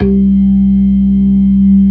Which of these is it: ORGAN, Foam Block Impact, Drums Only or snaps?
ORGAN